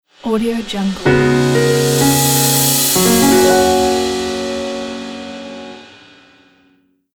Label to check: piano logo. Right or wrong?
Right